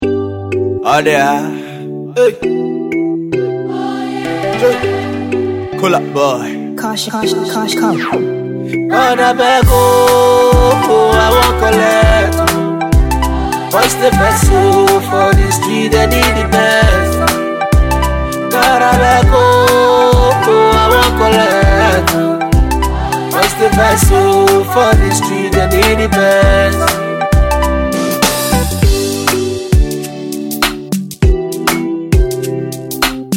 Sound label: Free Beat; Kolaboy